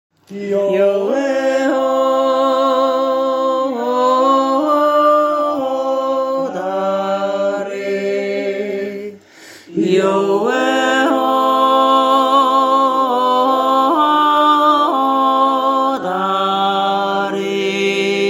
marienberger einzelstimmen

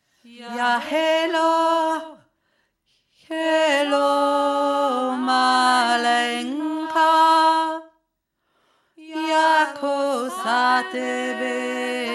tiefe Stimme

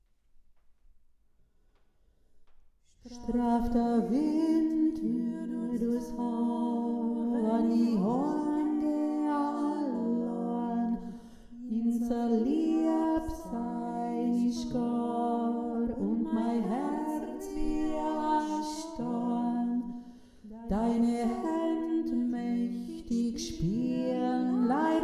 mittlere stimme

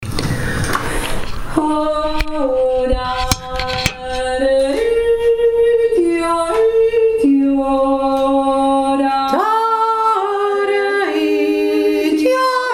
b uerinnenjodler

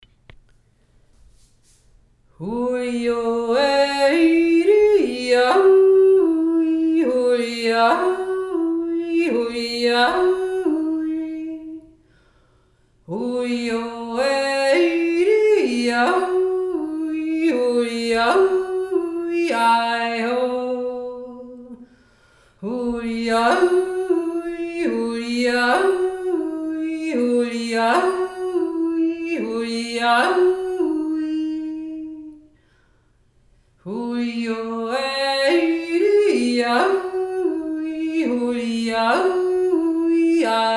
quintenjodler

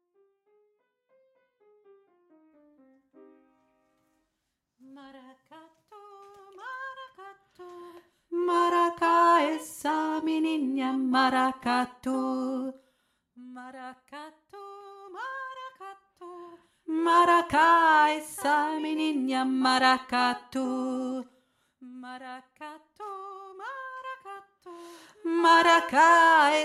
mara ka tu tiefe stimme

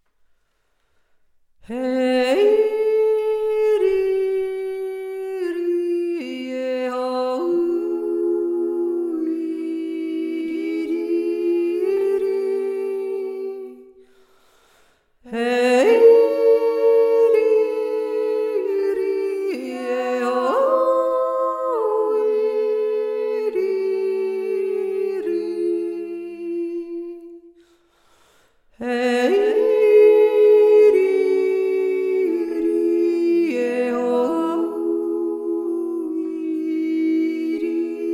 sunntugsjodler, jodeln im waldviertel; drinnen und draussen jodeln im waldviertel 2024

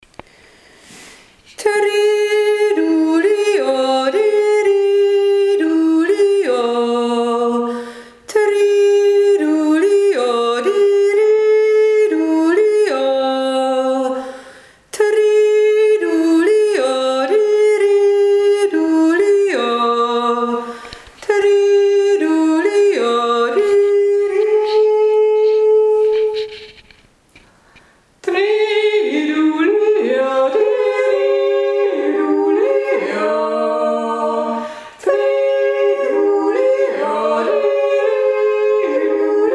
leibnitzer dreidudler

